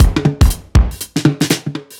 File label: Kit 2 Heavy Swing 120; off the grid